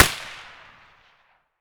rem700 outdoor silenced distant; Escape from Tarkov

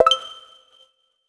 task complete; World of Tanks Blitz; GUI task

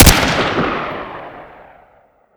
magpul, weapons; Modern Warfare

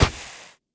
sand; Rust

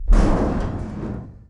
Damage Airframe Breakage In; DCS

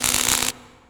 elec spark welding; Modern Warfare

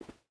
footstep hide carpet walk; Rust

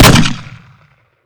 m79; Modern Warfare

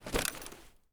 gear fast stereo; Escape from Tarkov

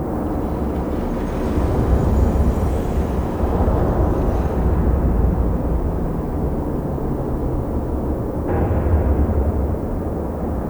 ambience hangar

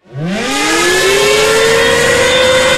stuka siren start; War Thunder

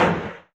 FW-190D9 gear up end, aircraft; DCS

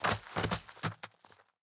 bodyroll; Rust

